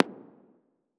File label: Echoing Pop